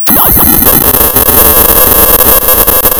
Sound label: pop, tearing paper